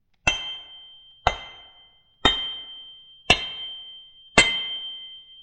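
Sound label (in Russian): звуки кузнечного дела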